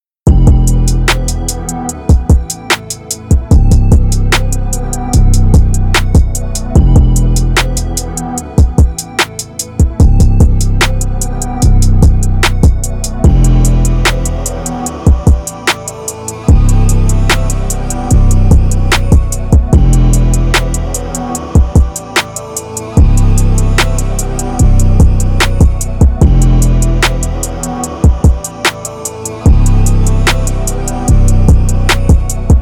Beat

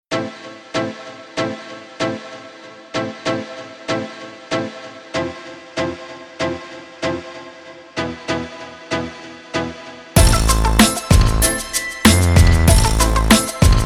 G Funk

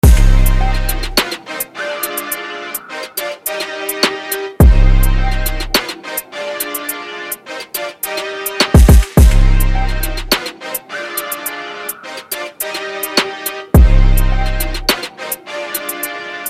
Drill

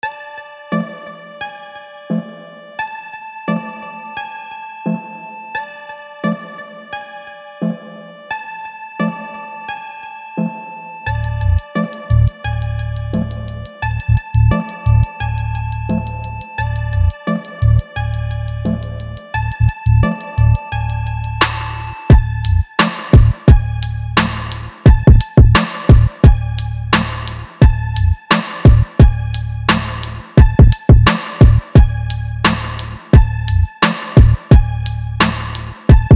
EDM TRAP

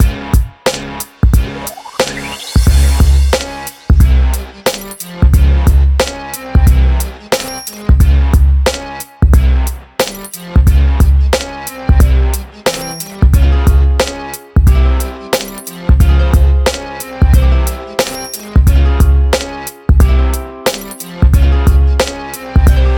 Vintage Hip Hop

